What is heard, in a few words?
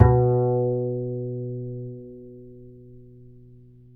B 3, Bass; Spectrasonics; Bass Legends